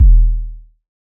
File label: electronic kick short